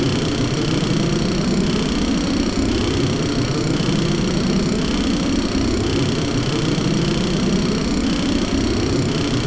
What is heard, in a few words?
rocket and driller; grade3; our earth